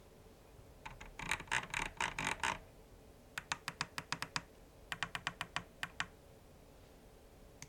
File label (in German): Tastatur Rad Medientasten